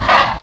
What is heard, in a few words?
attack; baphomet